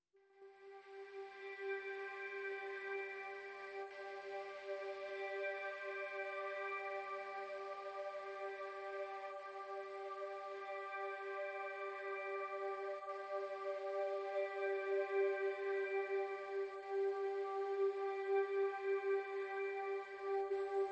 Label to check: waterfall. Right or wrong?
Wrong